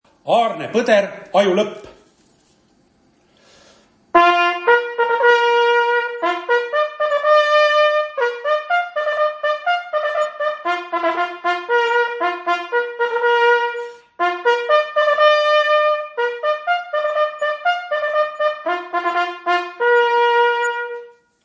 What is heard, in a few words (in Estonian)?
aju lopp uus, Jahisarve võistluse signaalid